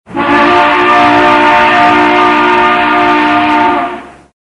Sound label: Train Whistle